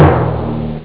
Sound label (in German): pauke; ST-XX Amiga Soundtracker Sample Packs